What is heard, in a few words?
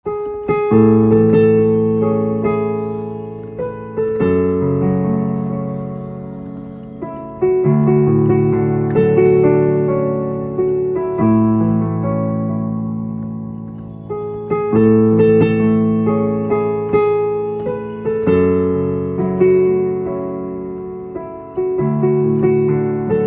Piano Silent